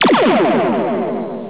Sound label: photon torpedo; Star Trek TOS